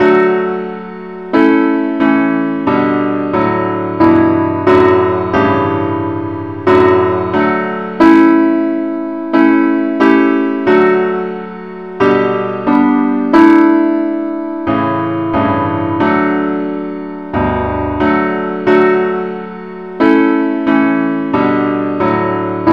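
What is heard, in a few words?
advance Accompaniment key D; Gwalchmai; Glory be to God on high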